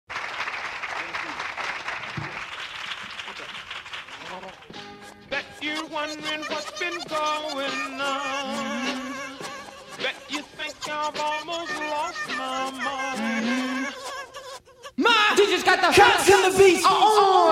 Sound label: acapella